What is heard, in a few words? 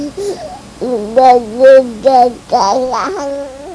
babble